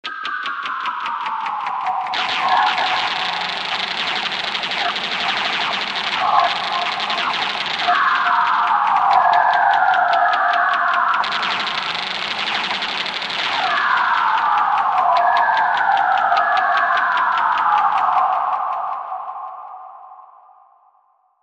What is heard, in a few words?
a fun sequencer sound